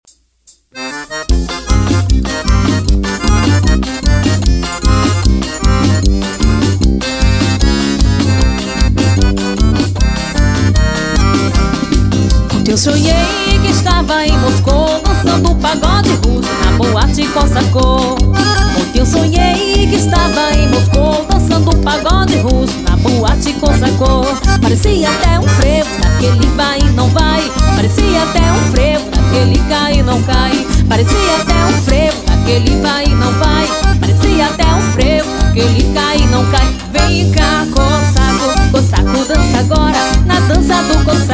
forro

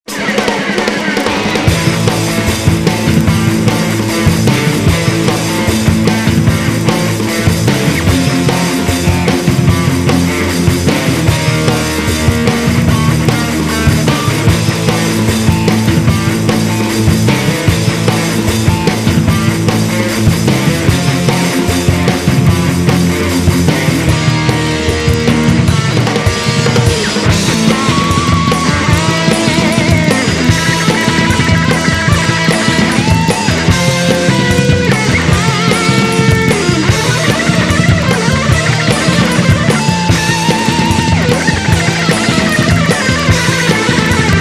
introducao guitar explosao

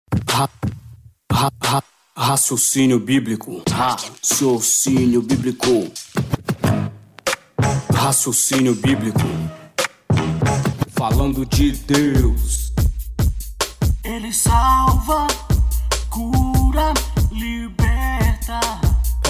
vinheta; raciocinio biblico

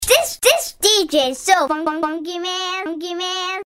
techno som

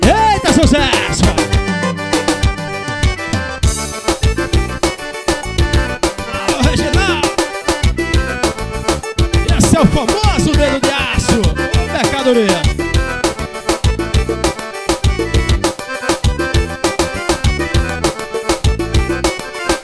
forró

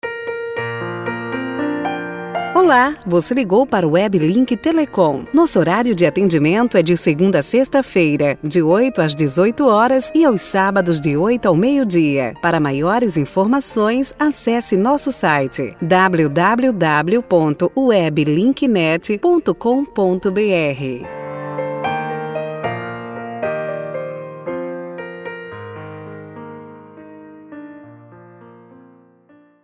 espera telefone; weblink telecom

